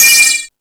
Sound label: Found Sound, E-MU Pro-Cussion